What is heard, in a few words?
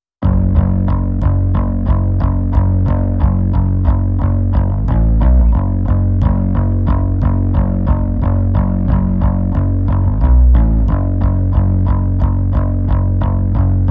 Bass